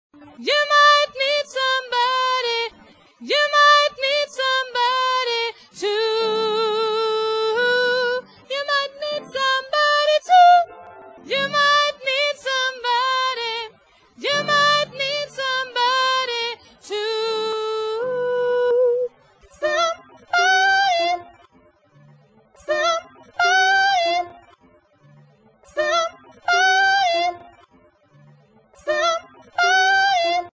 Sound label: tenor